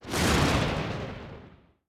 Lightning

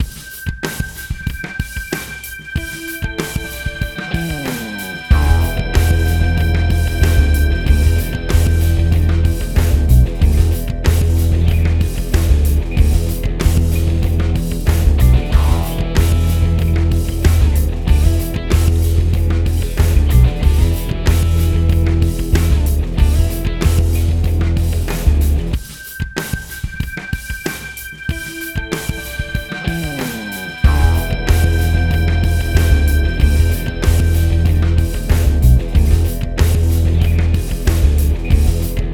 Loading Screen; super mario strikers gamecube

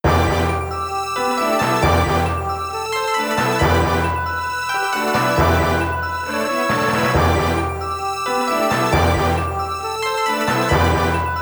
BGM Forest, Cave

